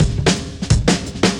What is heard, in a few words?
Beat Style, 170bpm, breaks